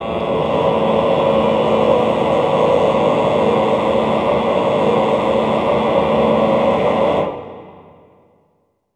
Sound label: Cluster male, Classical Choir; Best Service; Extended Classical Choir